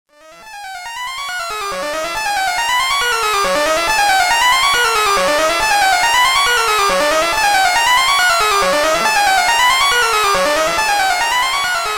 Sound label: sound lab with sequencer (church reverb); analog synth